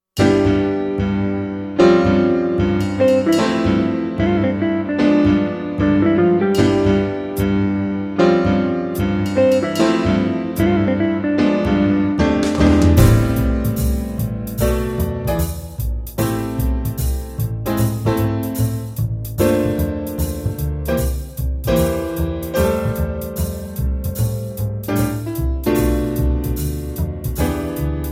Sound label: brass minus